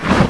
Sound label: wood miss